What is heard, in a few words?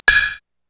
WBL, Korg Electribe EMX-1